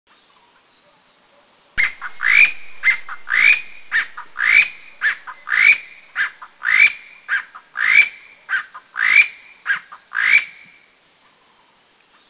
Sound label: Chinese Bamboo Partridge; Bambusicola thoracica sonorivox Near-endemic; Anmashan; Taiwan